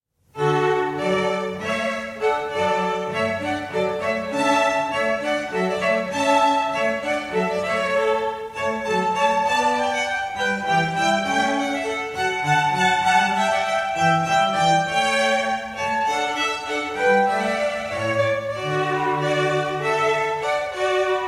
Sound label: Hornpipe Violins